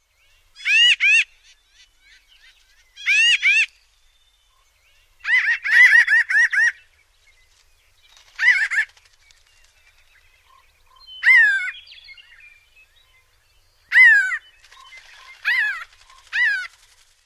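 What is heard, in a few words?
calls, Pinyon Jay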